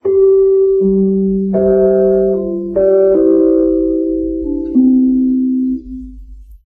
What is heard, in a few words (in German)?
pause mainz, Radiojingles